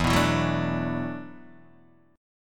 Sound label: E 9th Suspended 4th E down Guitar Open D